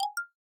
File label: drumset, drums; SKY IM-A800S-VEGA-LTE; keytone